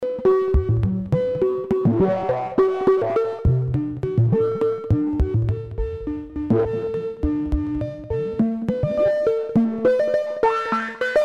Moog Labyrinth Sequence

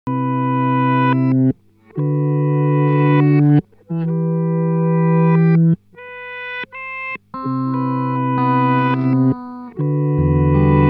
Count to 5 Sound; Montreal Assembly

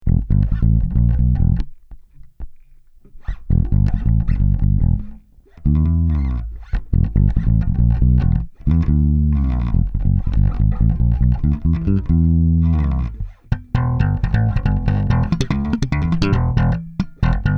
E-Bass; magnetismus 2; Kobald

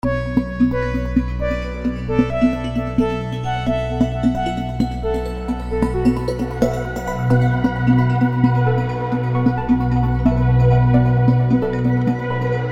AT Space Jungle; Vengeance Sound Avenger 2; Generative 1

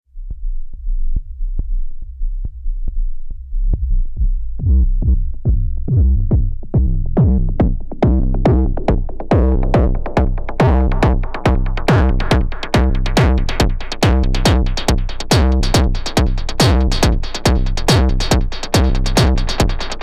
Intellijel Cascadia Kick Bass

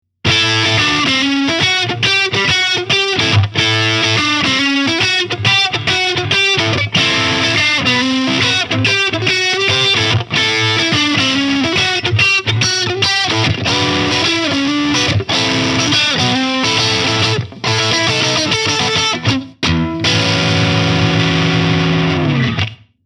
Fender AV II 57 STRAT MN SFMG Lead

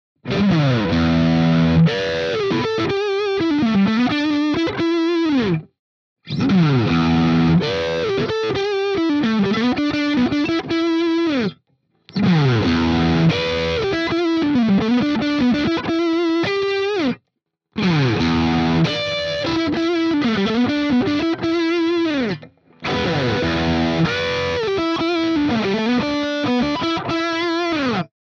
JACKSON AS Virtuoso SHP Rhythm Lick

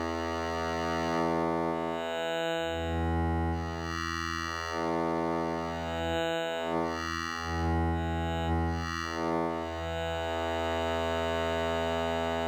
Preset Joystick; Behringer Victor

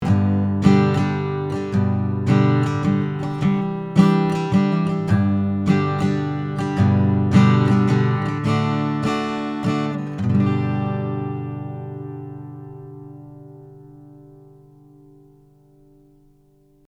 Royer121 40cm A Guitar Strumming

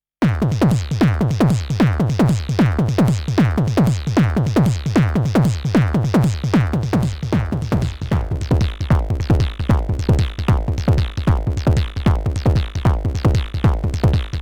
DFAM vs Edge Sequence

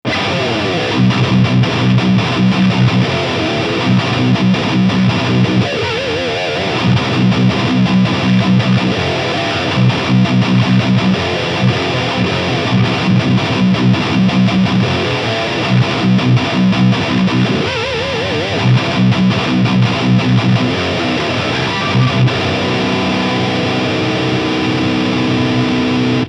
Schecter SLS Elite C-7 high gain

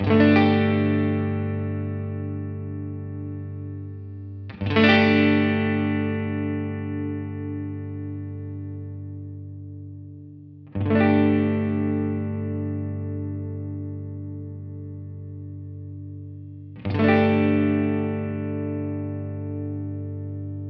Carl Martin Tone Tweaker Trebel

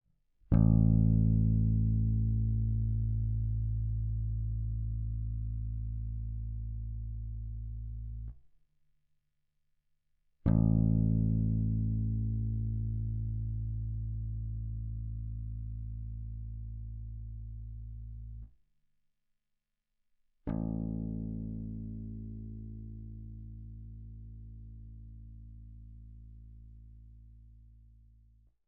API Audio TranZformer LLX Bass Pedal Bass Cut

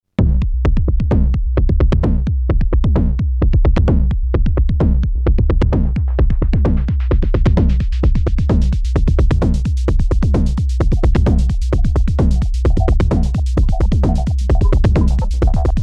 Erica Synths Perkons HD 01 Ping Pong

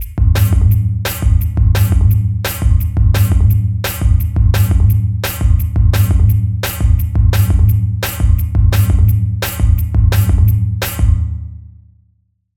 Gong Amp Beat Gong Kissen; AudioThing Hainbach Bundle

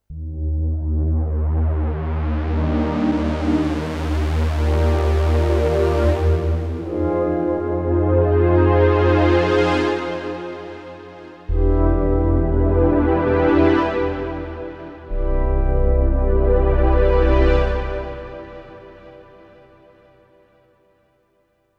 Roland RD-08 Synth Tempa Swell

